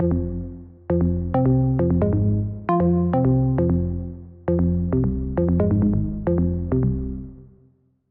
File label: mel blue one BPM134 key F